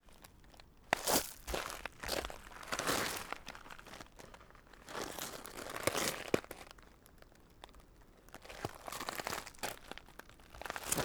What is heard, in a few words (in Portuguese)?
Passos e pes arrastando no cascalho e folhas secas; canais mono